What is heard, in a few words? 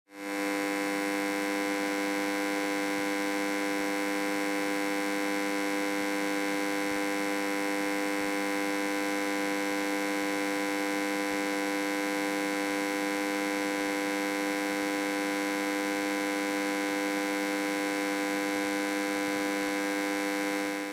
generator buzzing; made with Voicemod